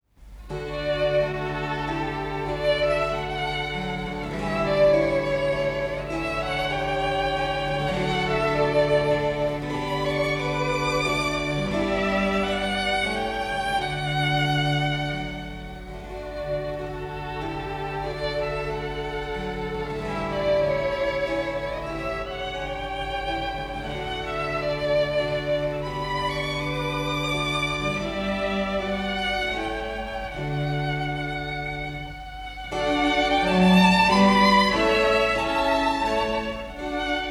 Sonata For Two Violins and Continuo Op5-2; Handel

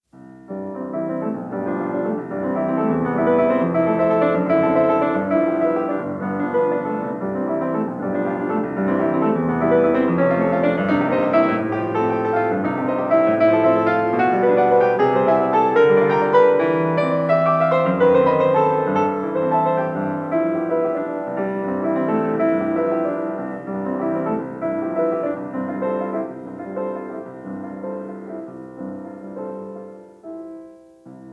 Preludes; Chopin

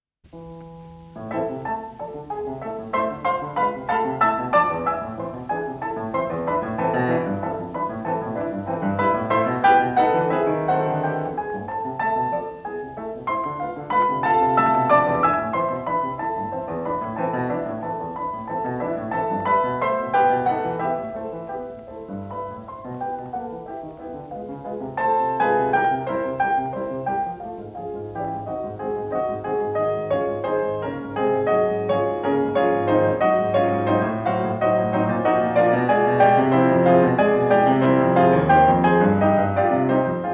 Etude Op25; Chopin

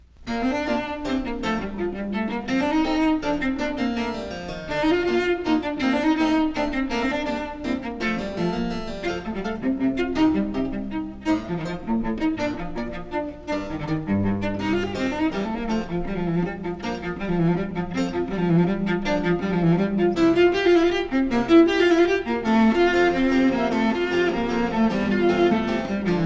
Cello Sonata; Vivaldi; Cello Sonata No6 RV46; Janigro

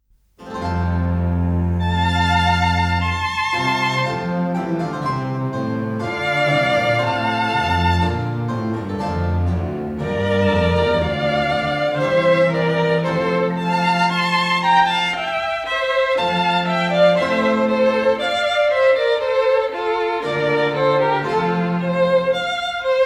Trio Sonata No9 Golden Sonata; Purcell

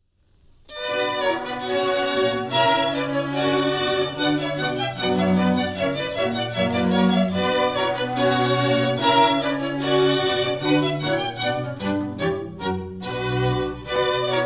Orchestral Suite No2 BWV1067; J S Bach

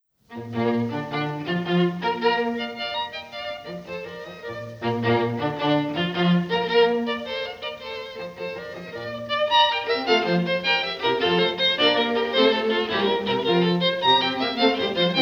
String Quartet; Haydn; String Quartet No1 Hob3-1 La chasse; Pro Arte String Quartet

